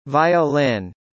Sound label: violin